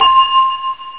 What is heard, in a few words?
Fanta Bell; 8,363 sample rate; Amiga 8-bit Sampled Voice